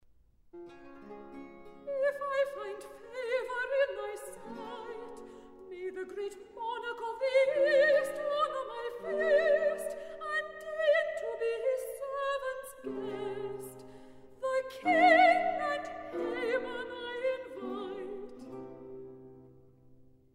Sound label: Recitative; If I Find Favour In Thy Sight; Handel; Esther; HWV 50A